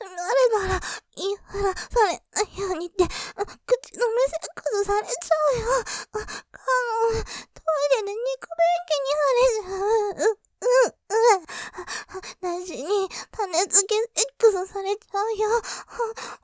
喘ぎ◆口止めセックス 種付けセックスされちゃう, ASMR; モノラル; ループで楽しむ！シェフの気まぐれ抜き抜きコース

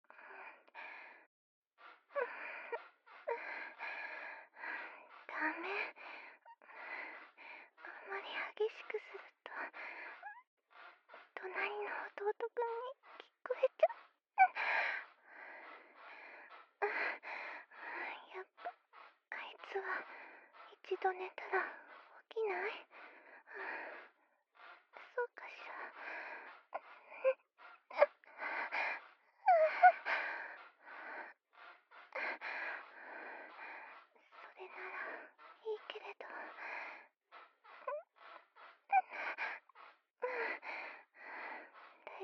壁越しに聞く夫婦の営み 壁越し編集 効果音あり大きめ

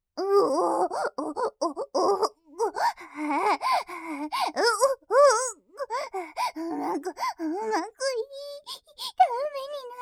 んおぉっ おっ おっ ほぉっ

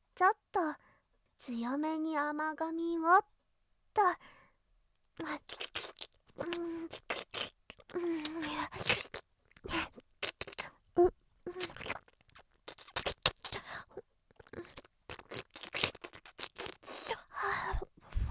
耳洗（甘噛みコース）; お憑かれ食べちゃう

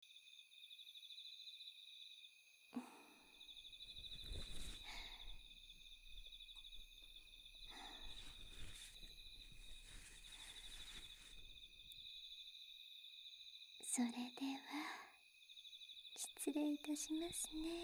耳かき 左; 【田舎耳かき】道草屋 芹-ご連泊【ソルフェジオ音源】; 一日目

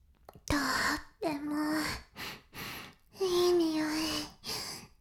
フェラ音声; 白くて黒い魔性円環

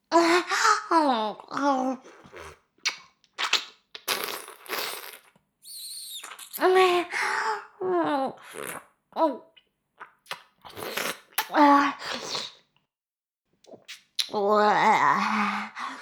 フェラ音声, ASMR; 少尉; 牧場戦腺

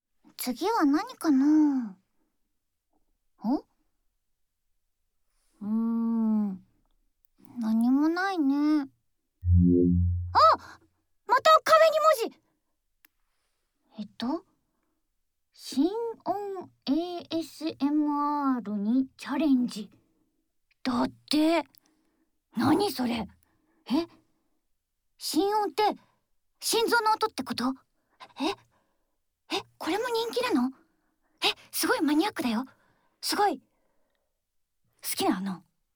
心音ASMR; 心音ASMRしないと出られない部屋

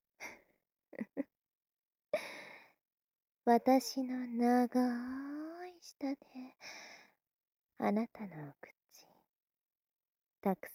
全身舐め; 立体音響版

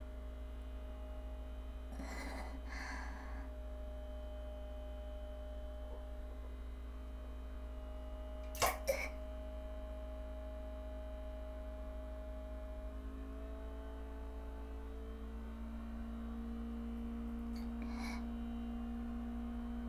大、吐息とポチャ音（ポチャ音大きめ）, トイレ

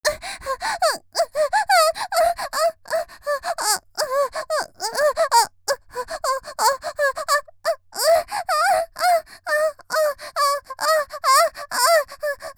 「喘ぎ」激しい30秒; 綾波系少女

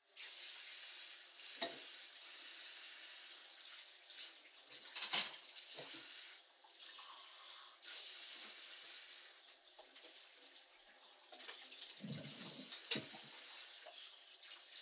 食器 を 洗う; 3DIO; 食事に関する音

